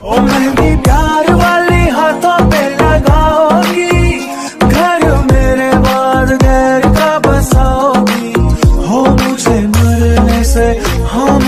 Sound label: special hindi punjabi ringtone, bollywood